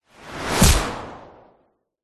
sound design whoosh fast loud with a hard smack impact hit; zapsplat